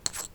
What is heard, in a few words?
chips Handle, Casino; Kenney's Sound Pack